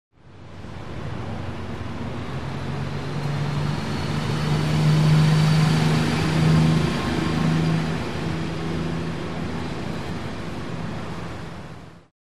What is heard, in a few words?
Bus By Close Medium Sp